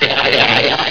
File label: toon noise